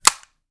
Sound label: weapon fire empty